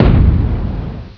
deep thud

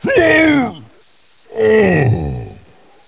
scale death

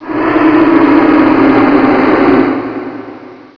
see, dragon

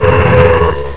pain; guard

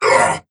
pain; doomguy

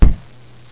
land; dusk

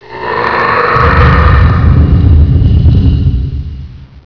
death; guard

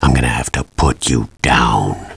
putdown

